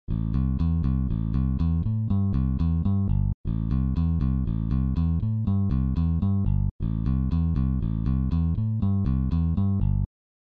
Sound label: Bass F